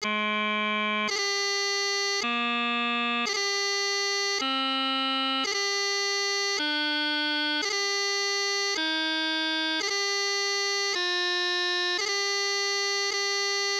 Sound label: F Doubling, piping